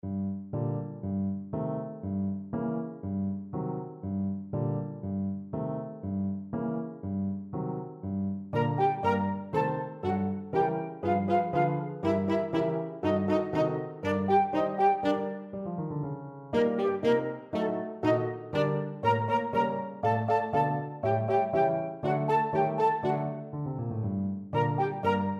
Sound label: flexible unison